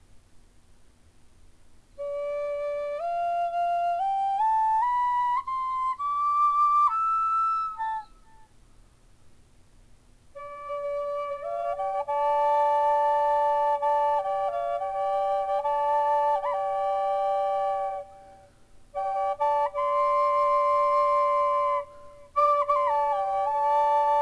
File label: hackberry high d, flutes